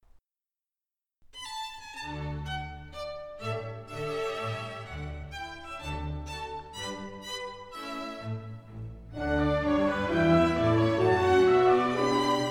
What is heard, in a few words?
Violin Con D